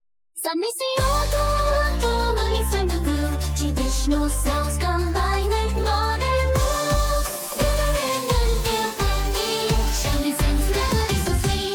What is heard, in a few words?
Shamisen Groove